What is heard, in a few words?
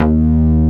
MOOGY BLUES, BASSOUNDS; Masterbits; Dance Trax